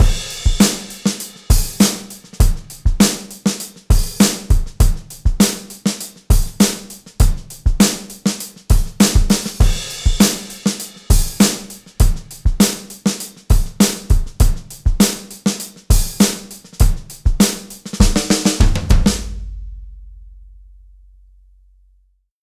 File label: drums 610 a mellow smack